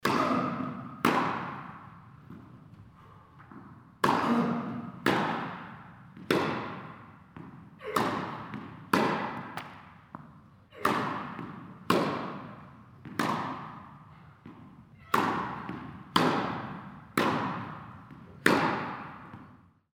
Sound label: indoor tennis serve